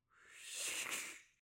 tabouret tiré; Mobilier

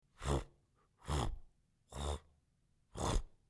chaise bois tiré assis dessus; Mobilier

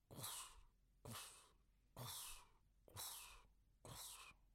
brosse; Fourniture

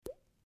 bulle qui eclate; Liquide; Menage; mouth-fishing

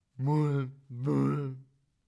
chaise bois tirée assis dessus; Mobilier

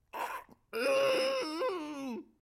porte qui grince ouverture

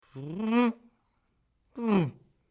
fermeture élcair; Fourniture; fermetture éclaire

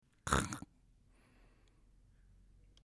ouverture cutter; Fourniture

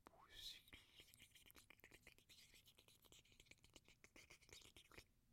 eau bat débit; Liquide; Robinet; mouth-fishing

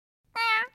scotch; Fourniture

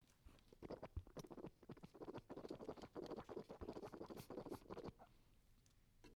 bouteille remplie secouée; Liquide; Menage; mouth-fishing

